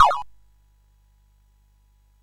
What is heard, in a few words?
Dark Castle, CSG8580R5